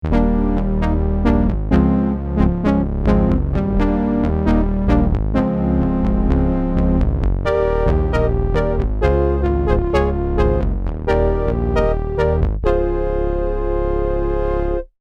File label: Soft Dark Bite, Prophet '08